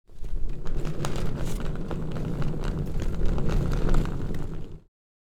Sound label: Suitcase on wheels movement on concrete